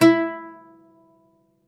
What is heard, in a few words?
OUD E3, Plucked Strings; E-MU Producer Series Vol. 7 – Old World Instruments